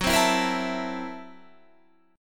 F sharp 7th Sharp 9th Flat 5th Guitar Half Step Down, chord